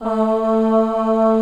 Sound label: CHOIR A3; Greytsounds VOL-1